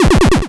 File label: portal